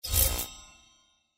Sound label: grid exit; Fondant Favourites StarTrail; Eyecon